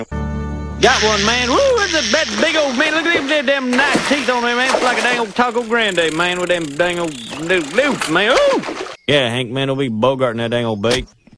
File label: Jumpin Crack Bass